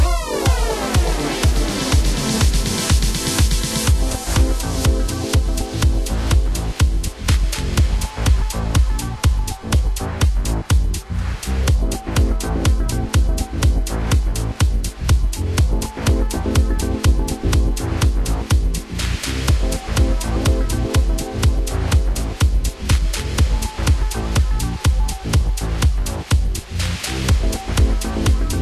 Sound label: Race Lost; Android